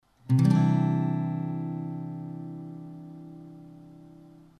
Cadd9, chords, guitar

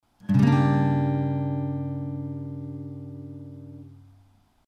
G dim, chords

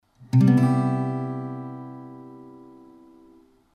Cmaj7, chords, guitar

